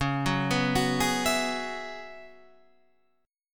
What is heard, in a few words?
F sharp 7th Suspended 2nd Suspended 4th, C sharp